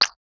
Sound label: soft quick sound of tap, slime